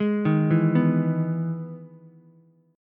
E Major 7th E down Ukulele

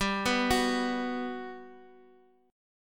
B 5th G; B5/G arpeggiated; chord shape; JGuitar

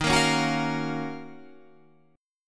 D sharp Minor 9th; strummed

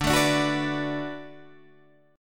D 6th D down Guitar Open D, chord

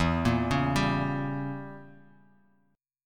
E Diminished 7th E; Edim7 arpeggiated; JGuitar

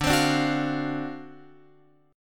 D 7th Sharp 9th D, Guitar Open F; D7#9 strummed

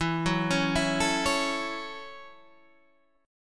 F sharp Minor 7th

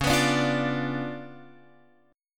Db 7th Flat 9th, Guitar, Open F; Db7b9 strummed

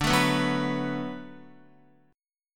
D 6th D down Guitar, chord

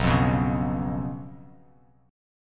B Suspended 2nd Flat 5th; strummed; JGuitar

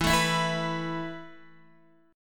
E Suspended 4th, Guitar DADGAD; x,7,7,9,7,9

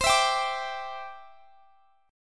E Minor 7th Sharp 5th C

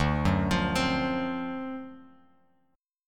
D 7th Sharp 9th, Bass Standard; 10,9,15,17; arpeggiated; JGuitar

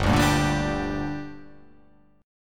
G Minor G down, chord

